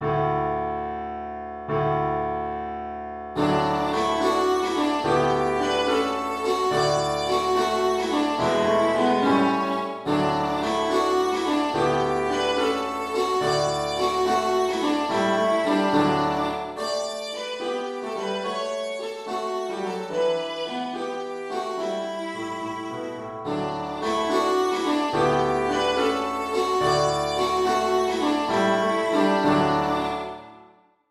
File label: viola